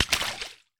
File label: water splash small item